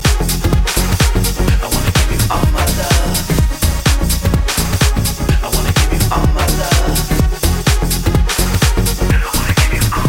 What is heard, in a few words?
Groove Shadow